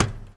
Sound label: metal step; Golden Crayon Sounds